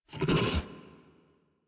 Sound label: idle alert scratch irritated, Kubrow; Enemies; Warframe; Tame